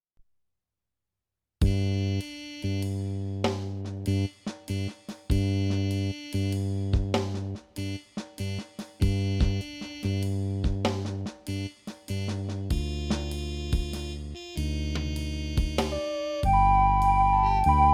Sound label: recorder performance; merry xmas